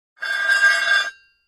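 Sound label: Metal Scrape On Cement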